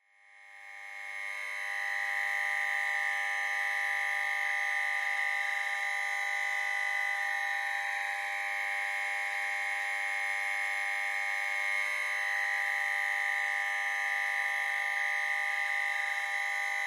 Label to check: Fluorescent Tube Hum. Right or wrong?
Right